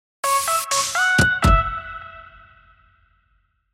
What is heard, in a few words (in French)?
logo sonore